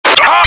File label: chase init; slender